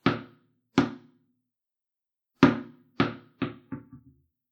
Tennis 3 Hits Then Ball Bounces Away

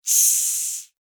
Insect Hiss Angry, Insect, Hiss, Angry, Bug

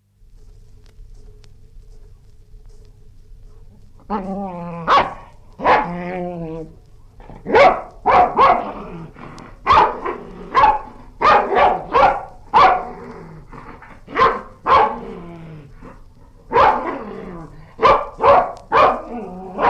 Dog, angry barking & growls; Dogs; Animals; BBC

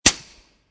44 Suppressed Pistol Field Fire; War; VRSonic

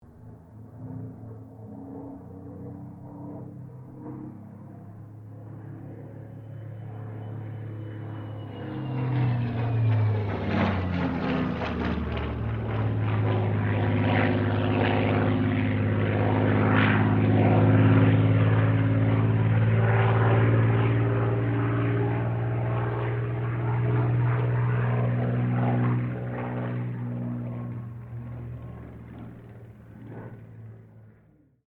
AIRCRAFT SUPERMARINE SPITFIRE MK-XVI PASS

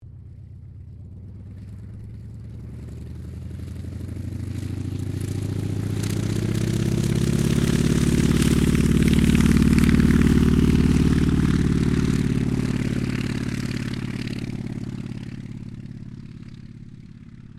AIRCRAFT CAC WIRRAWAY TAKEOFF; CA-16 Wirraway; Airplanes; Vehicles; Aircraft Collection; Twistedtracks

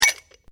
GLASSES STACKED MULTIPLE, Kitchen glasswear

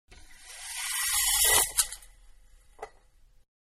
Blinds Down, Venetian Blinds; Windows; Household; Manhattan Production Music

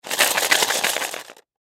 Toy Coins Plastic Shake Cardboard Box; Miscellaneous Toy; Blastwave; Foley

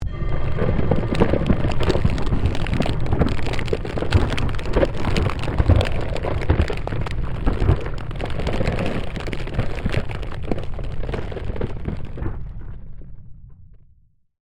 Created Magic Spell Glacier Quake; Series of created spell sounds

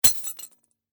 Industry Chain Set Down Tow Truck Cable; Factories (Sound Effects:Backgrounds)

